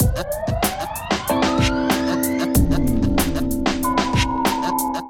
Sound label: Numerology Kit, Drums; Ableton; Factory Packs; Chop and Swing